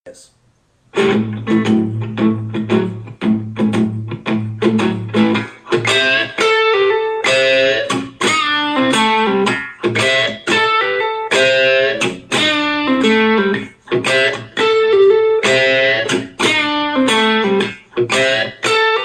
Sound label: repeater lick